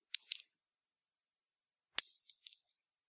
Shell Drop various

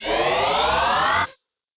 scifi weapon power up complex

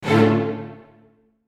short chord

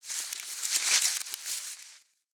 ruffled leaves

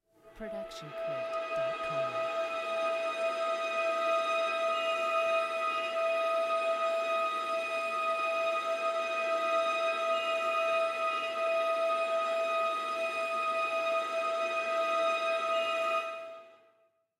airy strings

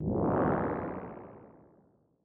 cartoon space ship fly away

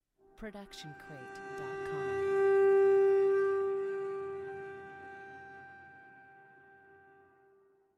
Cello Airy Scratch Harmonics; SoundsCrate

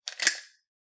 metal stamp; soundscrate

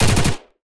Automatic Weapon Fire

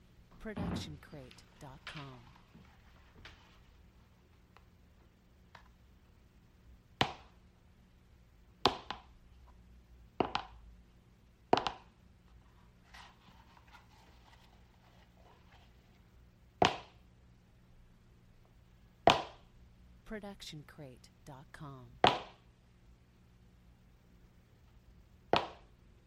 footfall heels; soundscrate

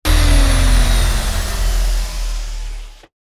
machine power down; soundscrate

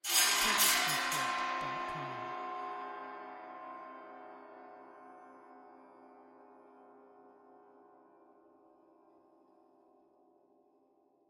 Metal Cluster; soundscrate

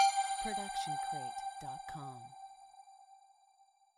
tonal blip; soundscrate

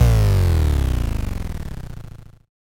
Bass Drop; SoundsCrate

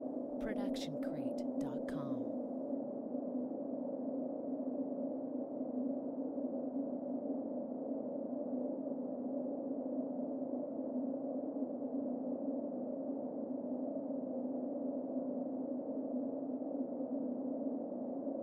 wind ghostly; soundscrate

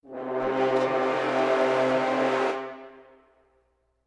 fluttle horn

